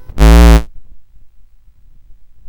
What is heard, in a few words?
sid, CSG8580R5; hvsc